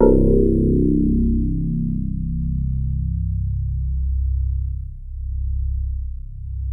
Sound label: DX7 HARD C1, E-PIANO; Music Store